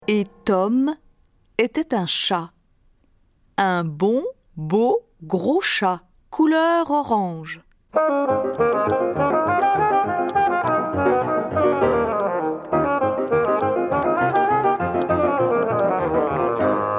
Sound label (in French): basson